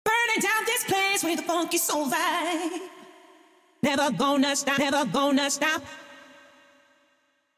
Vocals CLA Vocals

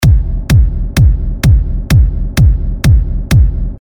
reverb

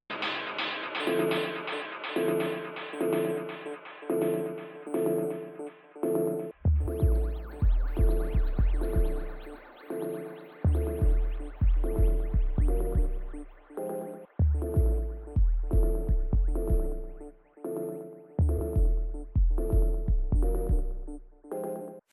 Synth Bass Comp One Clean

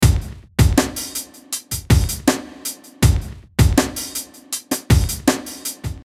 Beat 80 BPM Mix

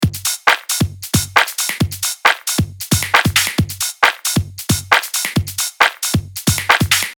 2 step beat 16ths; Beat Dissected

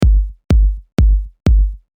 Kick Comp

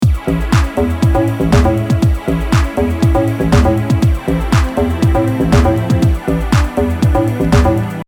Maj Ostinato

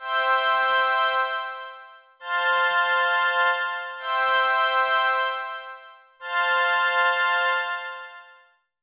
Juno 106 Hard High Pad

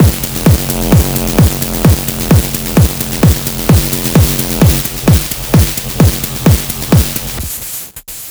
Drum Bus Drive Full Wet

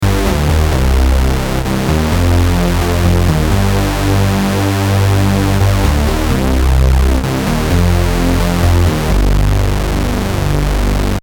Bassline

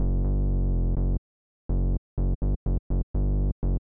Filter env

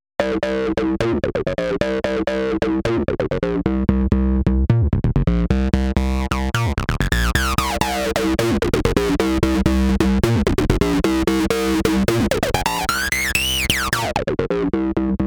Distorted patch

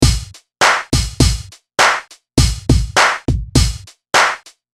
West Coast Hip Hop Beat; drum programming; sound design tutorial

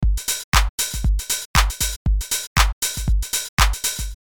busier beat swing

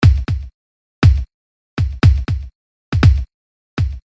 Retro Tinged Garage House

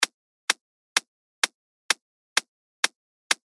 high EQ

